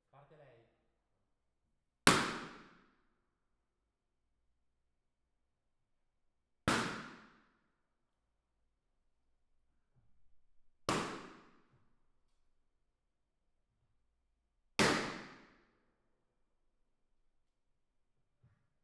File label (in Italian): Binaurale Palloncini, Risposte Impulso